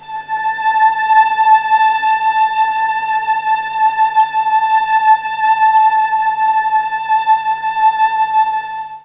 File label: A5 300 VIOLA, mellotron, STRINGS